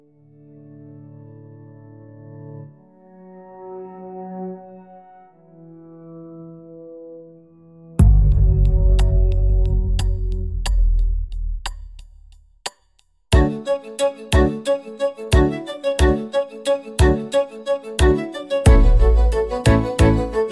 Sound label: cosmic orchestral